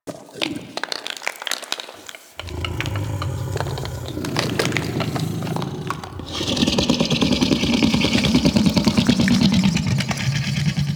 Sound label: Move Loop; Enemies; Infested; Warframe; Femur